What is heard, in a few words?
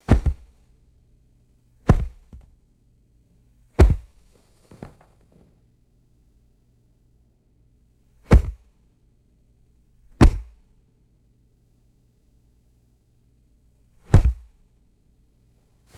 large hard soled shoe dropping on carpet; foley